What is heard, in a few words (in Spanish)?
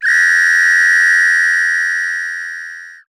Pito; Best Service; Festa Latina VOL-1; Single-Hits